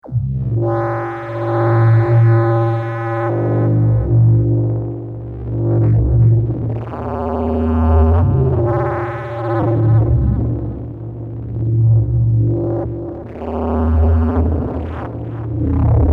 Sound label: Kaossilator